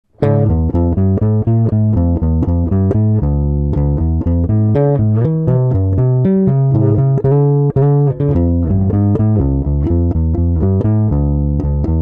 Preamp track

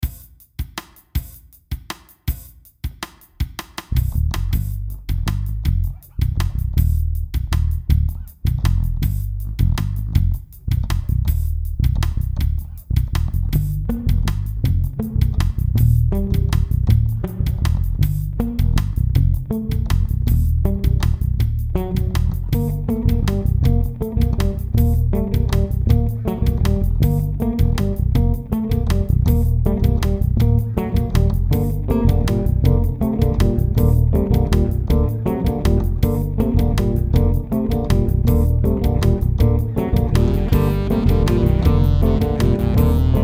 CAROL OF THE BASS

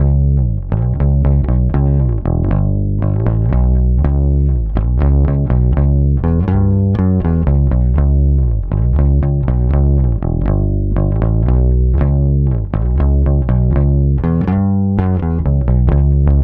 lakland dd lindy flats titan, bass; mesa boogie titan v12

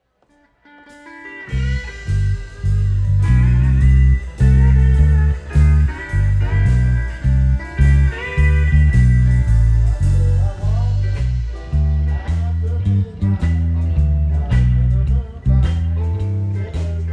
Walkin Bass

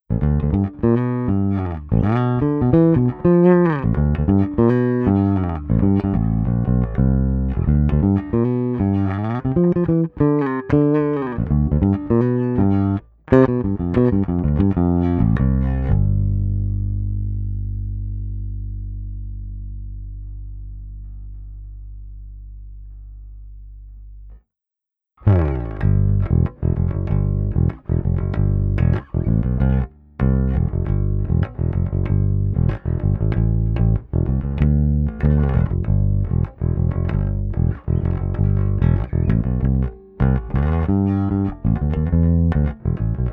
BASS ONLY; direct recording signal chains comparison

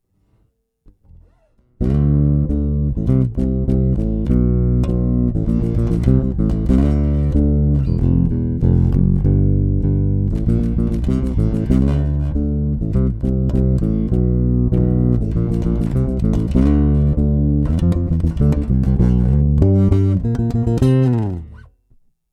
8 boosted finger, 8 string

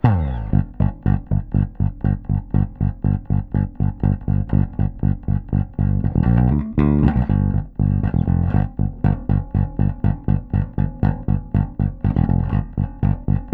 jam bass; king koa; nordys

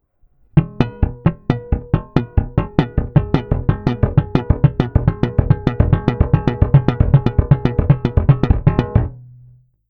Triplets; fun slap exercise

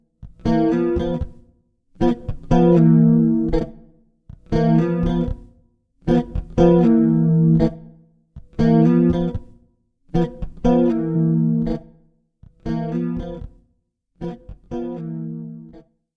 CHORDS STEREO EQ PITCH DELAY; Suhr Classic J oly white

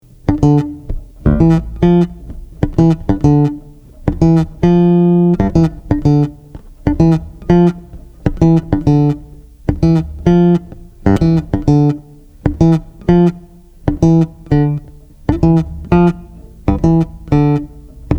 HARMONY

